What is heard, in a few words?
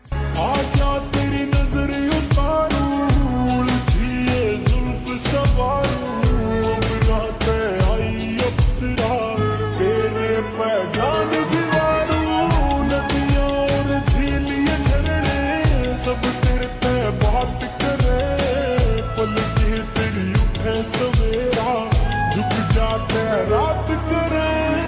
Ringtone Slowed Reverb